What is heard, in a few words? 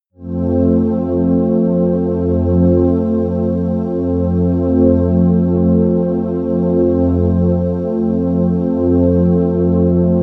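morning air, pads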